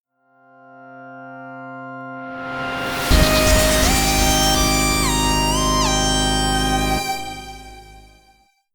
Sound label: Appearance Fanfare; Metroid Prime 2D